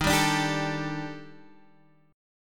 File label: Eb Major Sharp 11th, Guitar Standard; strummed; chord